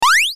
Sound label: intro Wheel